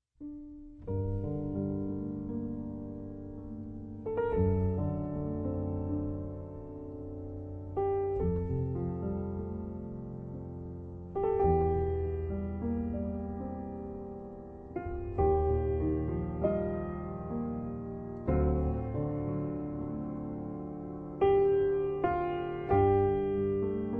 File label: Piano; Renaissance